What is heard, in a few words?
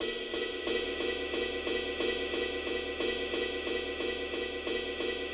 Jazzy, 90bpm; Сэмплы ударных (Легкая музыка); Beat; Drums; Easy Listening; loop; Ударные